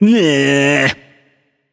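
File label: nonverbal tongue; voicepack; smite; merlin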